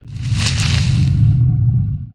forest hidden, creature; stalker

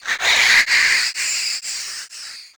demon breathe loop; nh demon

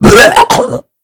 pain, mummy

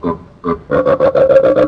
hunter laugh; ministrider; npc

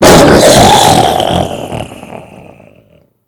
die, mummy

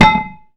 metal

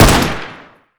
cz52 fire; weapons

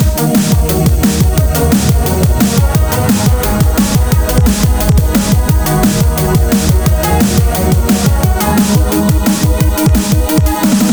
boss battle loop; nh demon

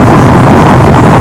fire loop, flamethrower; weapons

